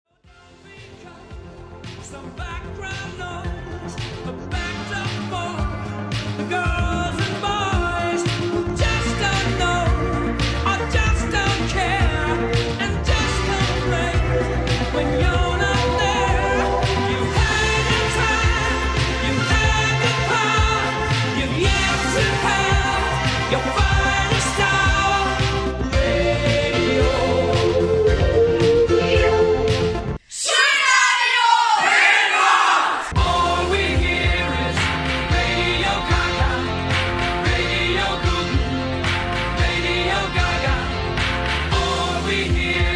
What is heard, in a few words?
jingle; schulradio live on air